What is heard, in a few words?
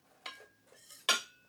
ambience room butchery working layer